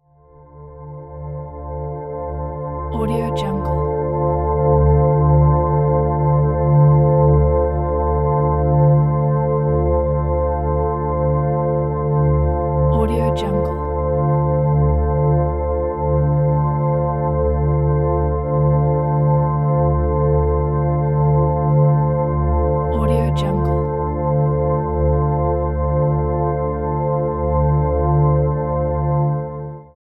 atmo pads earth; smartsoundfx

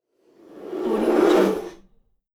whoosh; ldj-audio

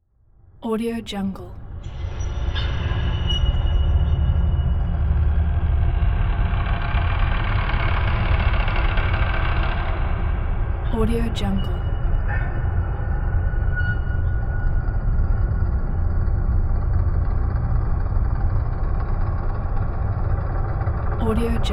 cinematic horror ambience

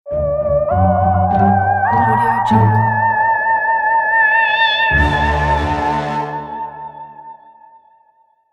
short halloween logo

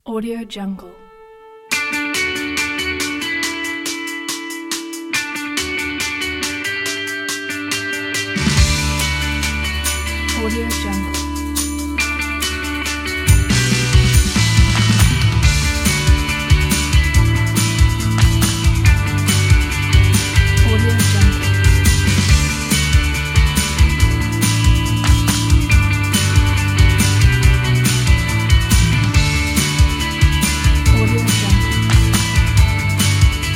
happy and inspiring rock

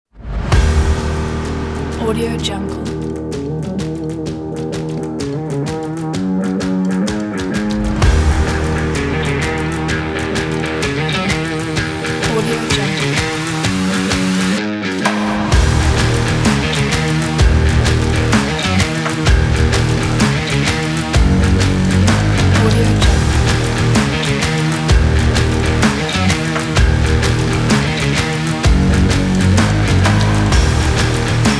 stylish action rock

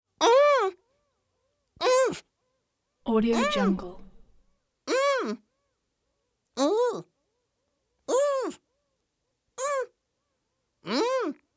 easter bunny scared gasps moans surprised

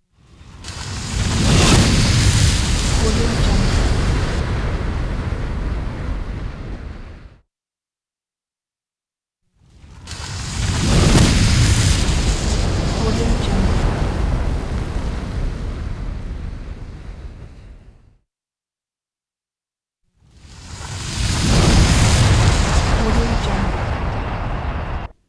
fire whoosh

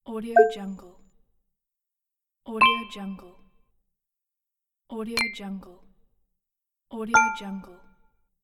clicked button

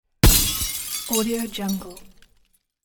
light bulb explosion; sound-ideas

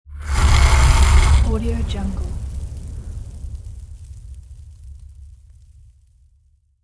dragon fire breath

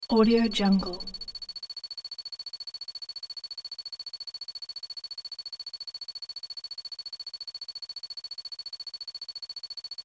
data scrolling

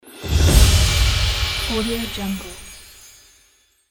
game award

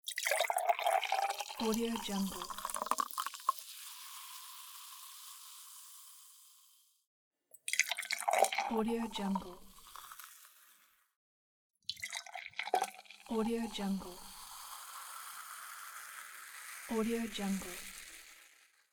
pourring hot liquid in a mug

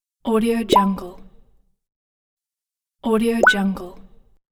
open a bottle

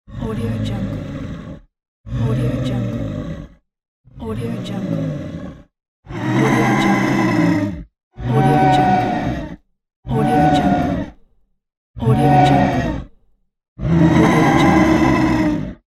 midsize dragons growls; creatorium-sound-design

